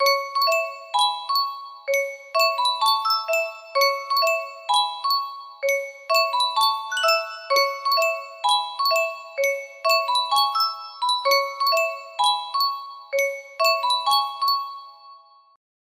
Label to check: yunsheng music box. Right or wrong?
Right